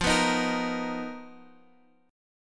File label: B Major 7th F; strummed